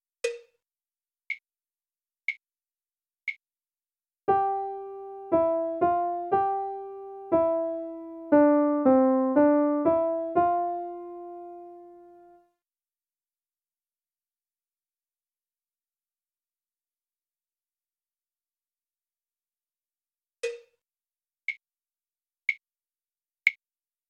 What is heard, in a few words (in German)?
Melodie